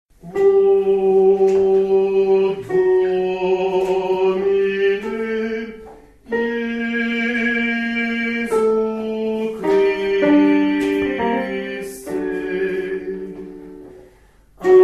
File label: O Domine Jesu Soprani